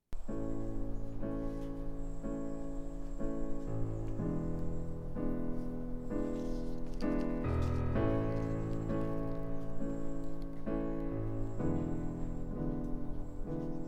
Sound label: alto